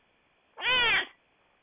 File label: cat meow